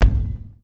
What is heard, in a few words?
Step Heavy